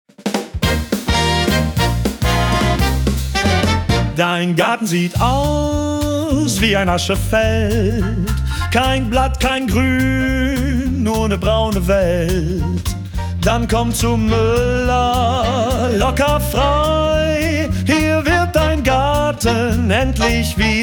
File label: BigBand